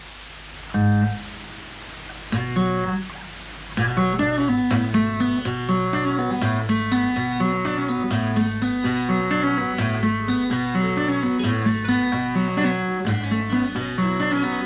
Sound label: guitar instrumental